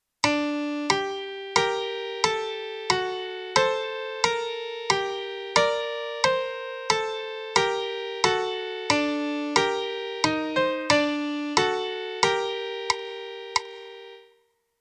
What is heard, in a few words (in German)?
Melodie; theorie; Melodiediktate